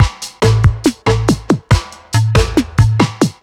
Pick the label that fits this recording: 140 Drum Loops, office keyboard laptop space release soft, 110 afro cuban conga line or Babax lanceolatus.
140 Drum Loops